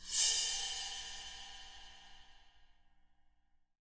BR CYM SCRAPE, drums; KITS-LARGE

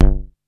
BASS SEQ G1; SEQ AND PERC; Music Store - Claudius Diemeter Schwerlos

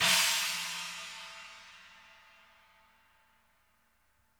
China, Interactive Drum Kits; Sonic Reality

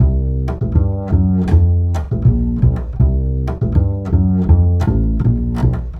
Acoustic Bass; Sonic Foundry; Leo Cavallo Bass Taster

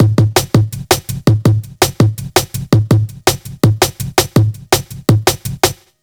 165 break, Explosive Jungle; USB Soundscan; AKAI

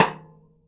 Jazz kit rim, Drums Acoustic; AKAI; JAZZKIT 1; 90s sample CD; USB Soundscan vol.10

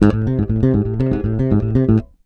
RUMBLE, Ultra Freakin' Bass, 112 BPM; Sampleheads

